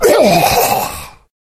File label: death; xonotic